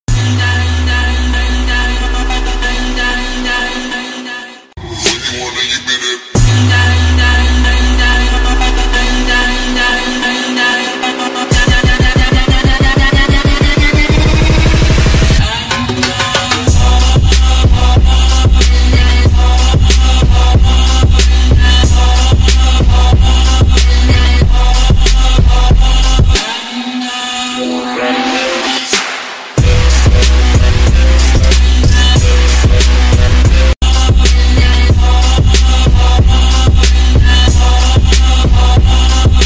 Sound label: new English ringtone